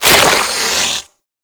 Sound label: Proto Infested Mutalist Big Jump Flesh; Warframe; Abilities